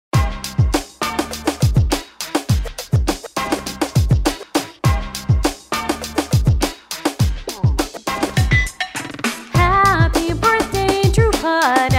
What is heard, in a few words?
Dhrupad